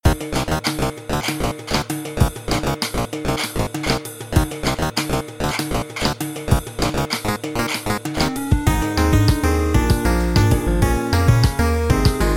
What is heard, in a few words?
Lab BGM